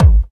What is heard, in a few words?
BD, Dance Mega Drums; Best Service